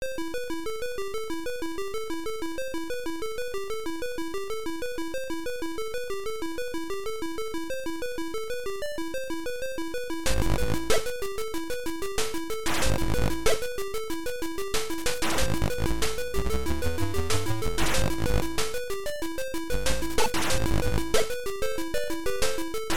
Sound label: CowBell Version; CRTC